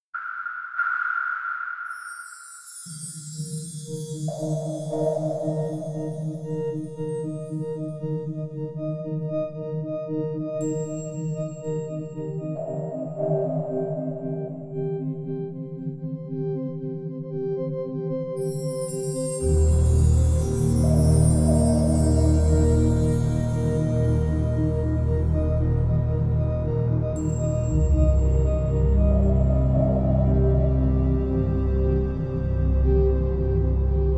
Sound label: Peaceful Ambience; X3 Gold Edition